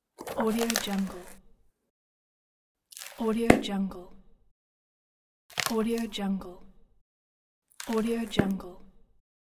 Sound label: open book